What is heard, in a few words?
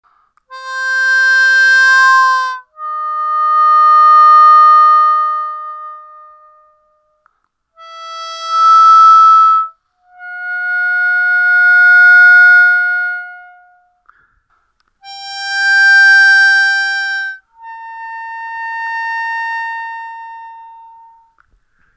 blow to choke to overblow